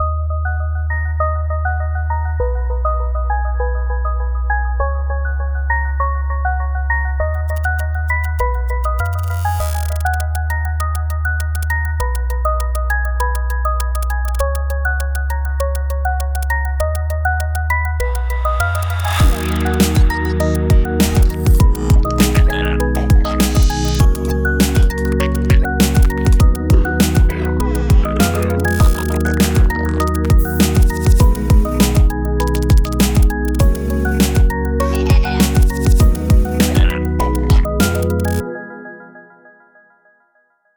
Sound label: Ringtune Glitch